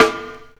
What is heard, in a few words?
small reverb steel snare drum A key